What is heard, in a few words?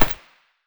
scar silencer mono distant